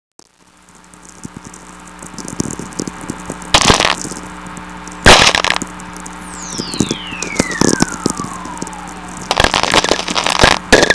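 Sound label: whistler; Natural VLF Radio Emissions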